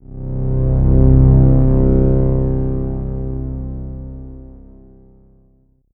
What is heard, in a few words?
Roland JV-880 Wide Strings C4